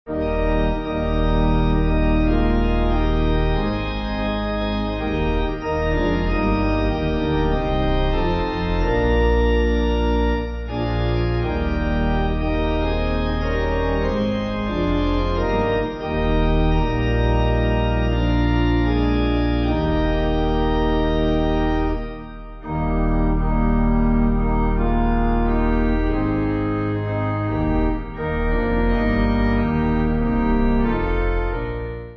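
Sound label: Our Blest Redeemer Pipe, Pipe Organ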